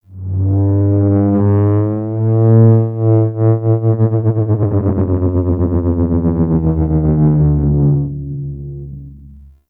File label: Terraforming Egg Vocal Dry; Warframe; Tau